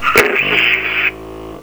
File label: blast hit, Lazer Tag